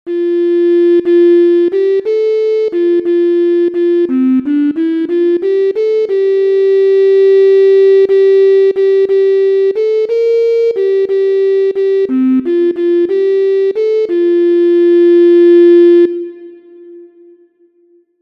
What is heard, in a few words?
MIDI